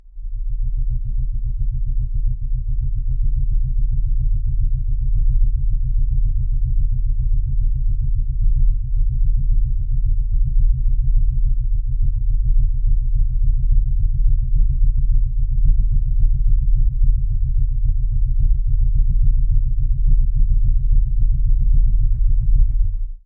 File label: Rumble Pulsing; bottlerocket